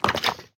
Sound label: wither skeleton step; Minecraft